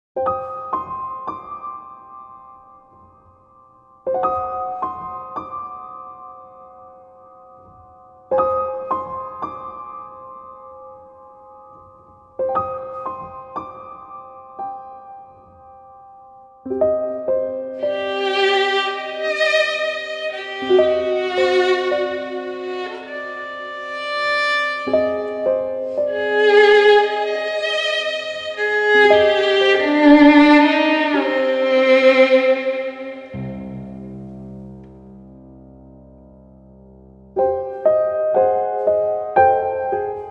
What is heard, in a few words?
Piano, 절망